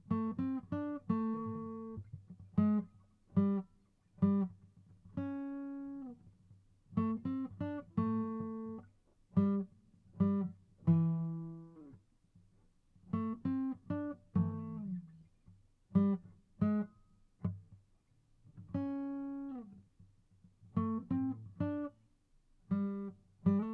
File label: Plaza Guitar